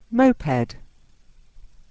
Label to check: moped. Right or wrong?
Right